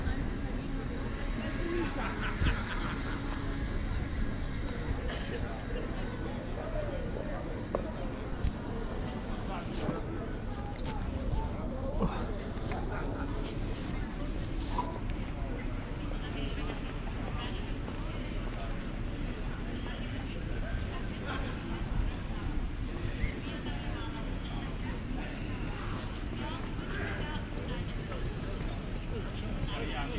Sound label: ambience Euston station